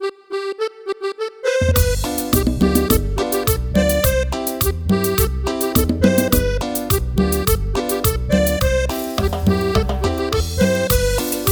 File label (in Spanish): Cumbia